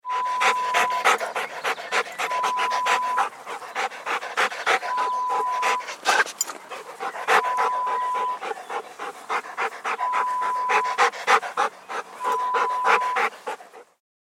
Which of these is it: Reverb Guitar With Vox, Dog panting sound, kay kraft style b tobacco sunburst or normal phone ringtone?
Dog panting sound